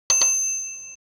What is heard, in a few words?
service bell double ding; mixkit